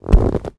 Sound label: padding walk